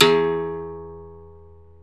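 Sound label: open string, berimbau; Berimbau Xswitch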